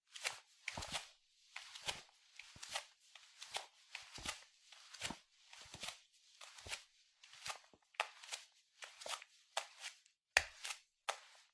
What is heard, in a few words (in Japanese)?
フローリングを裸足で歩く